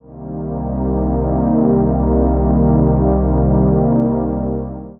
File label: warm analog pad in c minor sustain